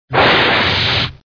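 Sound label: too powerful sound for a pistol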